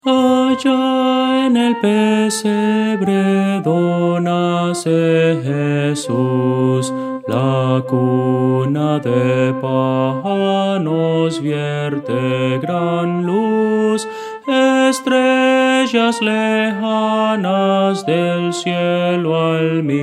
soprano; Voces para coro

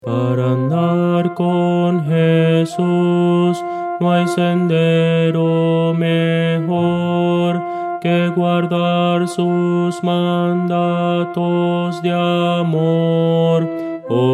contralto

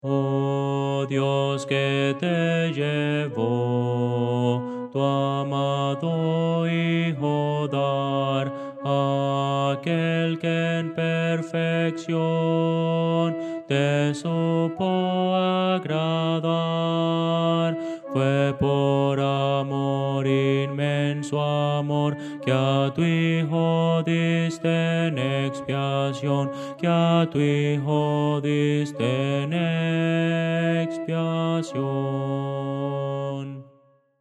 contralto; Voces para coro

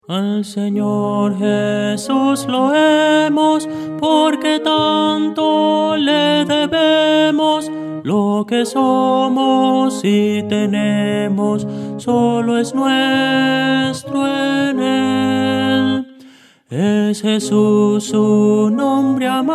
tenor

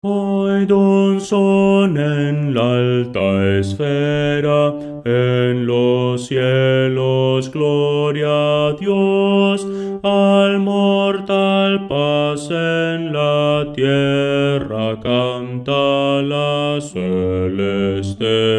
oid un son en la alta esfera bajo

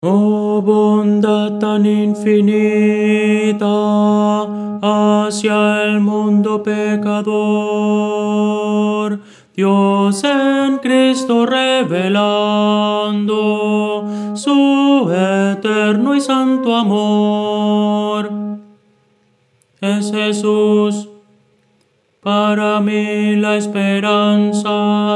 tenor

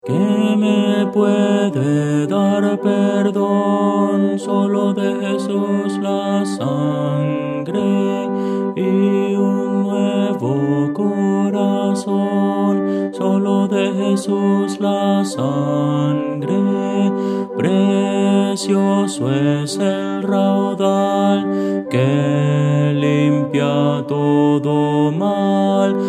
bajo, himnos cristianos

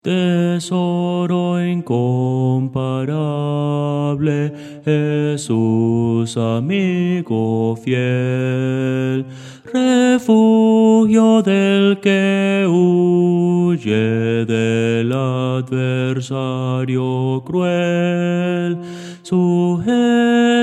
bajo; tesoro incomparable

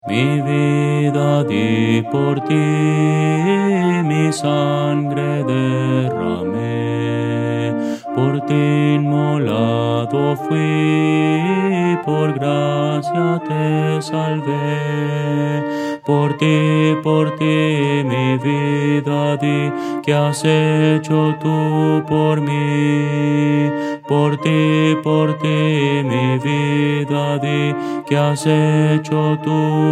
contralto, himnos cristianos

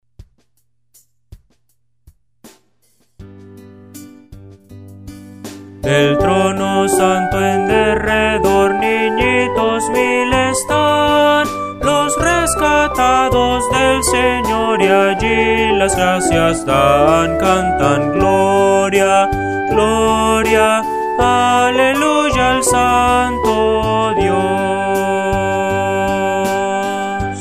Del trono santo en derredor, Himnos Cristianos

